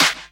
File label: CLAPS HOU; Best Service Dance Mega Drums